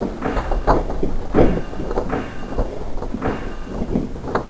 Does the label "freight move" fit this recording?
Yes